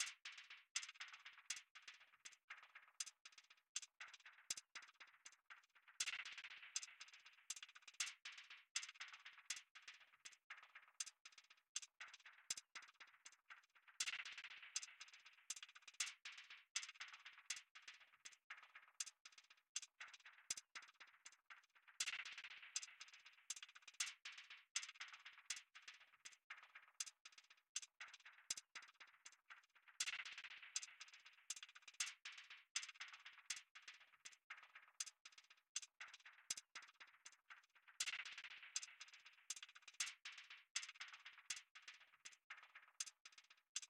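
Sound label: Percussion; Worms Rumble; PS4 PS5 Switch Windows Xbox One Xbox Series XS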